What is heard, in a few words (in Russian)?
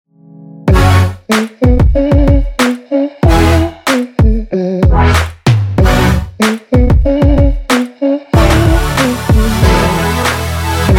Отбивка